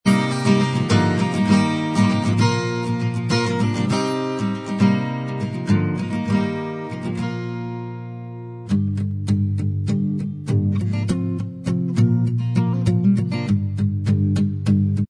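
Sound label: acoustic instrumental, backing track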